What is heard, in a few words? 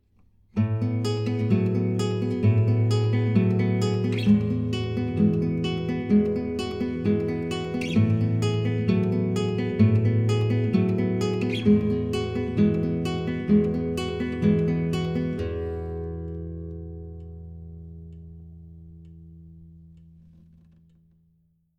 Right Hand, guitar